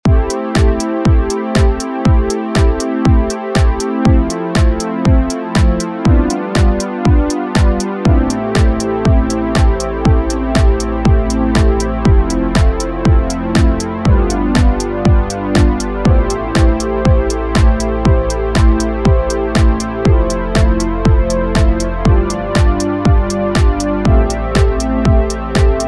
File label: Jupiter Poly Smooth Pad